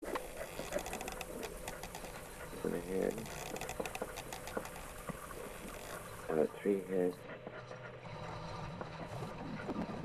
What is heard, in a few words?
wind sounds; austin six short variations